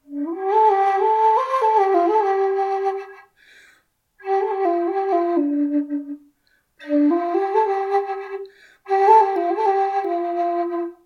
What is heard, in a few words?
bamboo flute, music samples; Coyote Oldman Anasazi-style end-blown flute, 2011.